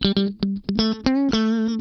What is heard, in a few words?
Funk Guitar; 90 s Sample CDs; Zero G